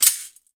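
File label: METALLIC SHAKER, JINGLE STICK; Voice Crystal - Steve Reid Definitive Percussion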